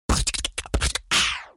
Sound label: beatbox